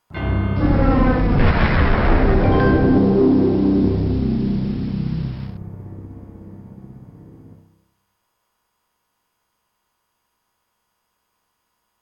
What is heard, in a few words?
Soundscape; Dark Forces